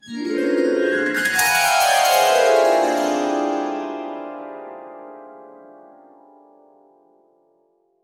subtle harp glissandos

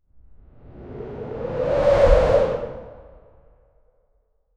whoosh or swoosh fast

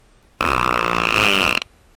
fart loud

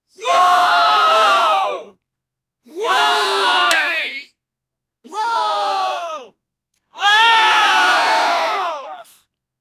peoples shock and sayi

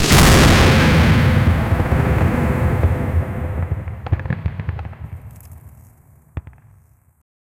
epic fantasy sound effect, flames

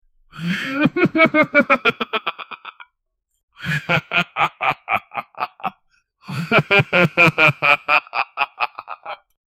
funny laugh from a; humor

